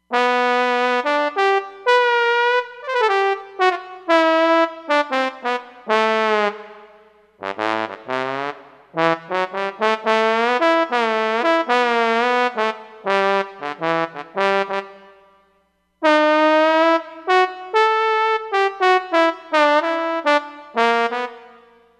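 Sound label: Solo Trombone, Roland Fantom XR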